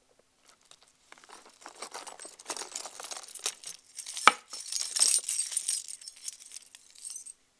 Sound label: Keys Jingle